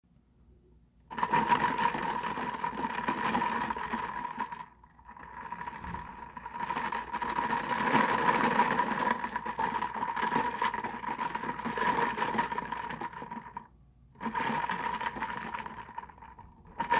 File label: icewater